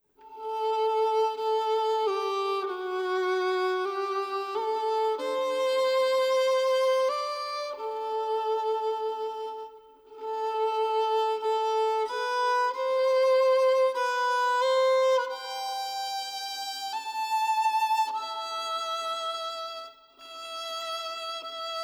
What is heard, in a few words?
Sad Violin